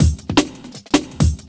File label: Beat, Futurist Drum & Bass; Drums & Percussion